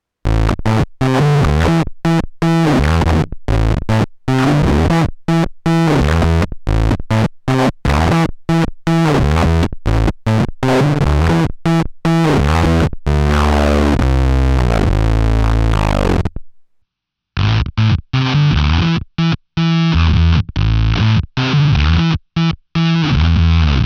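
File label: octavius squeezer filter, bass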